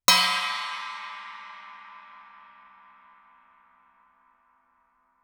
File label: chinese stick bell ff stereo, Suspended Cymbals; Percussion